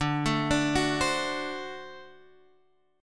D 7th

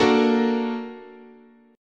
A sharp Augmented Major 7th, guitar chord

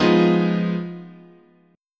F Minor 7th Flat 5th, guitar chord

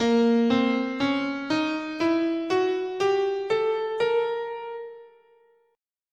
Bb Diminished Whole Half, scale

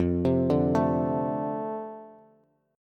F 6th F down Bass

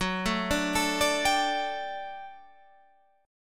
D Suspended 4th F sharp, guitar

